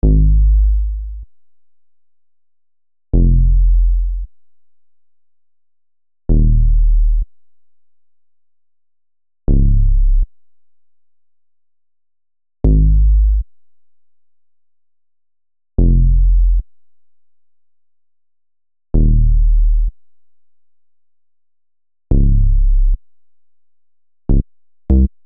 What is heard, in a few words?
SH101 Bass